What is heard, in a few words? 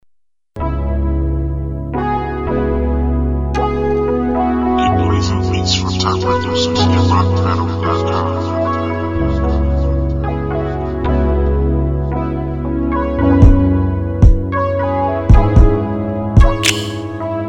MATTRESS MUSIC RB